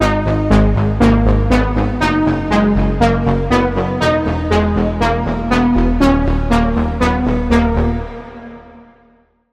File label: Lead; Private Gun; Routed Sound; Spire Expansion - Noble Gangster Vol.1